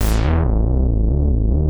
TECHNO BASS 140 A

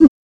CUICA; drum; PERCUSSION

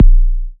Minimal Kick, Drums, Kicks

